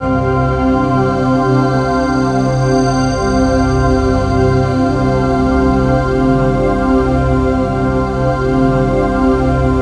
composite, ethereal atmosphere

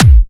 Dubstep Kicks

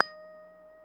D#5 FUNK SFT, GUITARS, FUNK STRAT; Spectrasonics; Hans Zimmer Guitars VOL-1

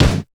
combidrums, techno; single-drums

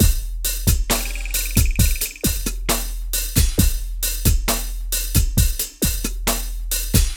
PHATT BEA

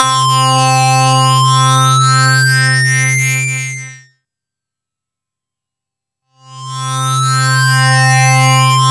C4, Synth Line; 90 s Sample CDs; NiceBeats; SynthLine VOL-21

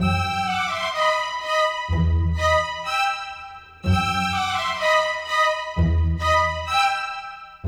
Strings; Sonic Foundry (Sony Creative Software); Orchestral VOL-2; Modern 35

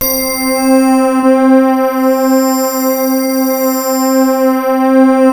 BETTER BELLS, ENSONIQ VFX; Rubber Chicken Software Sample Bank

